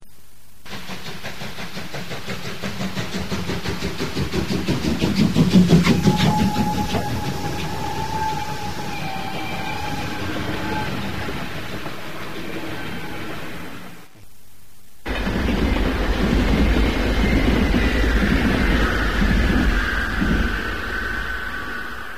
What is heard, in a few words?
Locomotive And Train